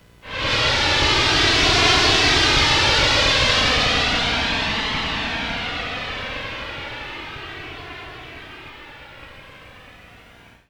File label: Flyover